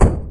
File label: flesh step, doom3 pinky